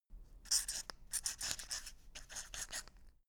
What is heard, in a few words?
quill write